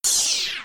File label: Laser gun